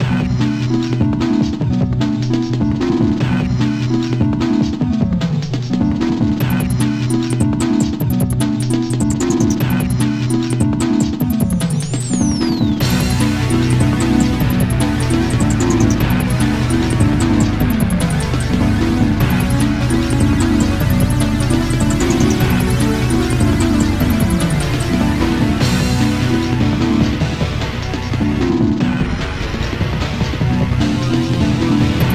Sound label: race; Airace; DSiWare